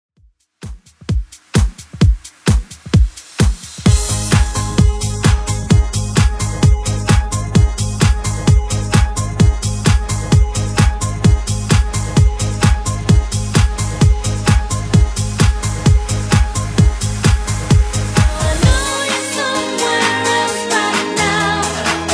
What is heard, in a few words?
90s Redrum 130 bpm